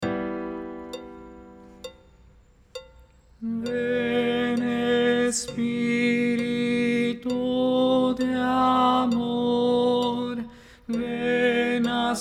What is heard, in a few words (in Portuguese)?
contralto